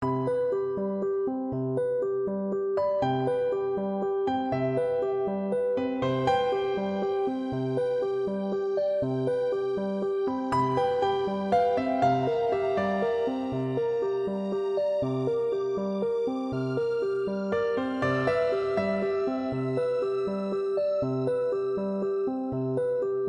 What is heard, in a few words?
bgm; encyclopedia